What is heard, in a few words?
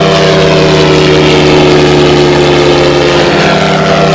drone; quake2